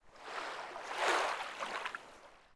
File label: Oasis Wave; Ambient; Civ4